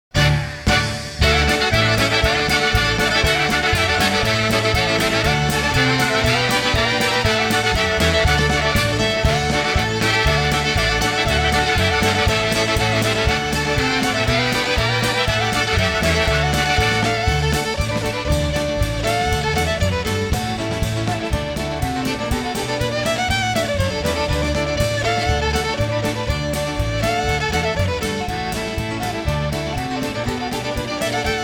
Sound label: Polka